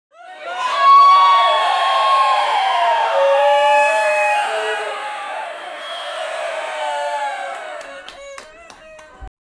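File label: audience screaming and cheering, small room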